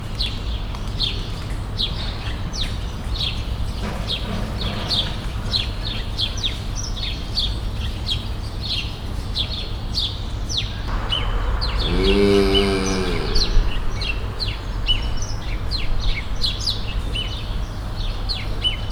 harom boges, voroshatu bivaly; debreceni zoo 2019

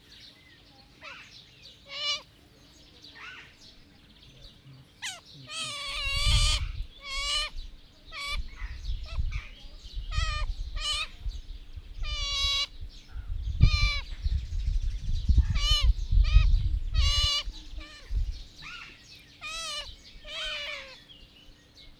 etetésre várakozva szél, azsiai kiskarmú vidra; jászberényi zoo 2015

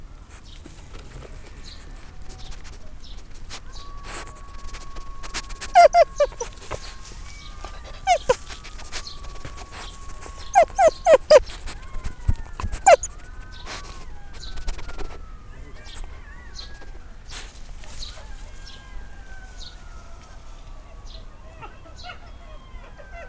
intenzíven szimatol feszült, aranysakál; szegedi zoo 2011

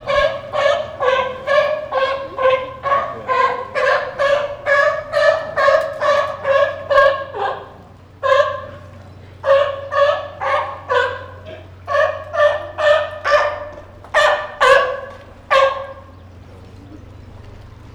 egyfolytában, kaliforniai oroszlánfóka; állathangok; nyíregyházi zoo 2010

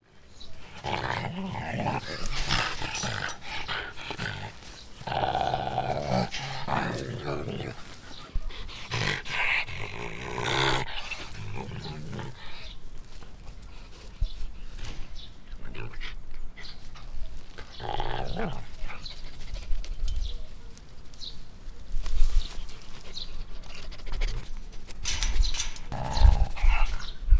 aktivitás, aranysakál; szegedi zoo 2011

